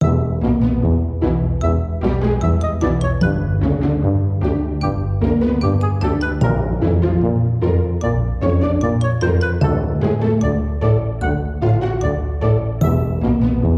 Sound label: soundtrack